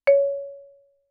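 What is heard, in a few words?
Marimba cord fortissimo Db2; MIS Pitches - 2014; Percussion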